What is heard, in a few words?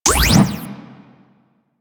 Fire, Weapons; Lato Prime